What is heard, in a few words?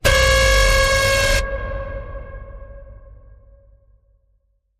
Siren Sweep